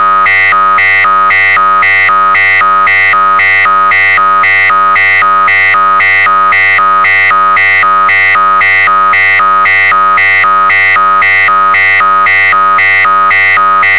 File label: alert